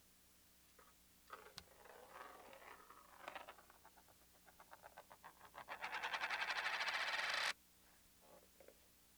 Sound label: SM62 Foley